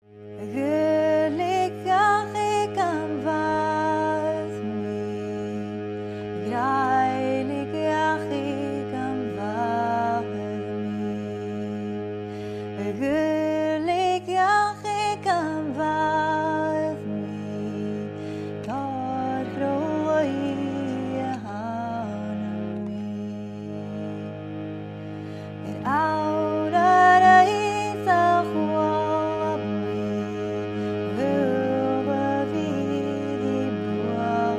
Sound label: irish song